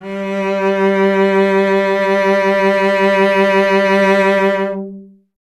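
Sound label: strings cello G; Miroslav Vitous; Solo Instruments VOL-1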